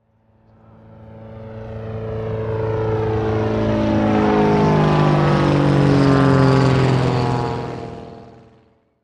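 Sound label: Plane Hurricane overhead By Pr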